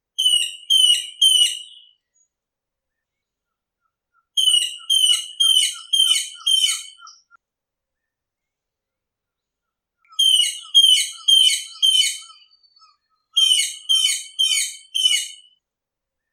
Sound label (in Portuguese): Canto do pica pau dourado